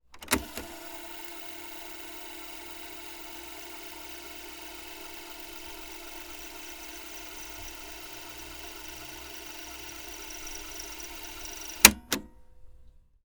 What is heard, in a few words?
Cassette Tape Deck Rewind Start Stop Clicks